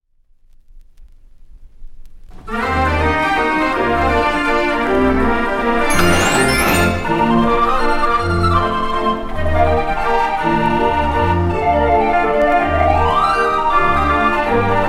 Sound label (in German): draaiorgel; jupiter